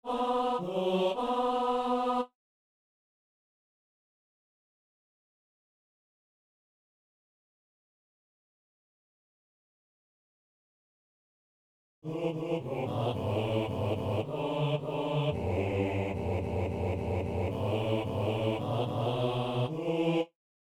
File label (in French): notre chemin, basse; Saint-Denis